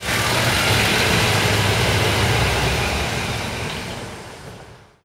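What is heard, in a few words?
caetano levante, bus; Simutrans; Pak128.Britain-Ex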